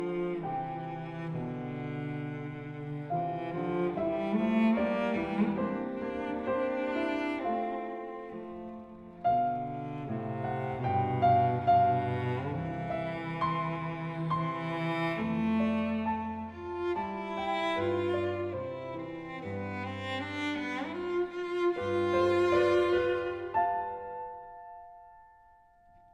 Sonata No. 4 in C Major Andante Allegro vivace

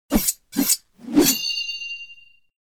Attack; Lust Princess

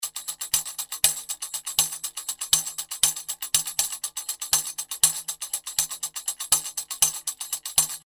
120 tambo skinned sixteenths

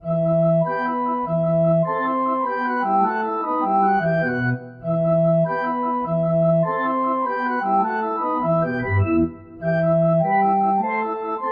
Gigue

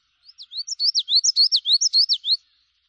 Common Yellowthroat

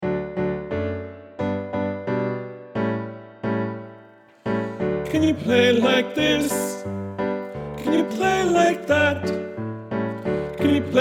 BariTenor

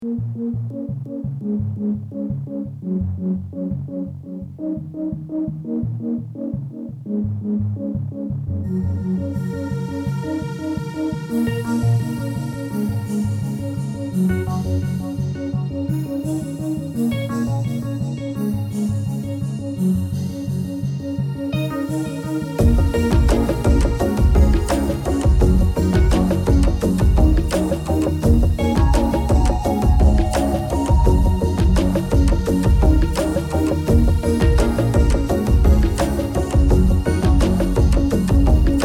New Age

